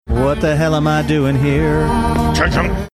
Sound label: singing what the hell am i doing here mimicking guitar noise